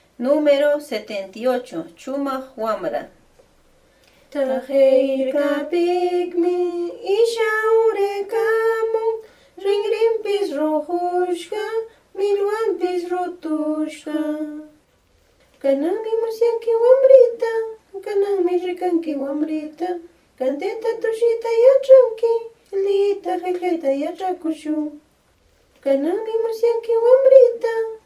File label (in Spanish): cancion